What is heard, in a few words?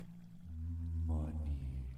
money donation sound effect; callmecarson; twitch